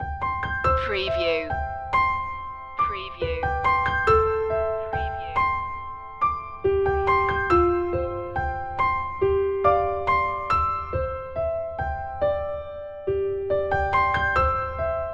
fragile piano and guitar 60s

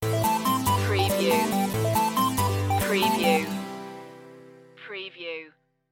singing robots stinger

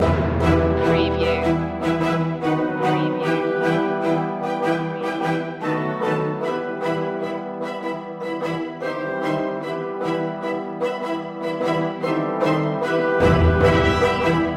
neon nightscape distance loop long

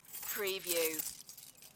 Key shake; beanstalk audio

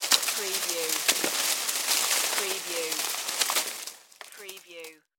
Foliage Leaves Move

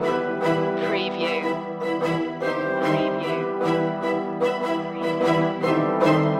neon nightscape distance loop

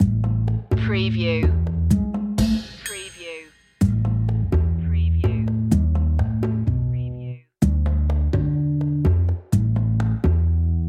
bass and percussion

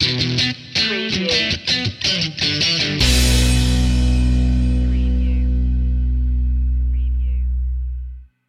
rock life stinger

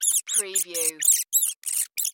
Rat Squeak

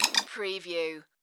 Tui Tweet

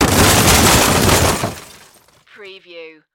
Crash Heavy

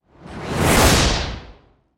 Magic Spell Whoosh

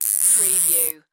Cable Tie Long Zip

